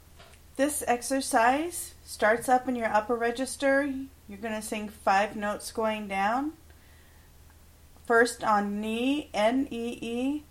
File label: vocal Nee Nah, male warmups